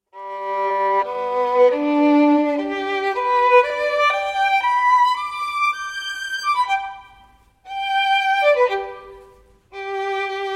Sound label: Solo Violin; Solos of the Sea